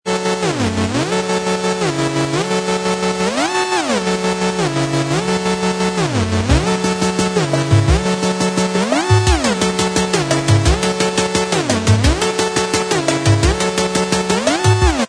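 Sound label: instrumental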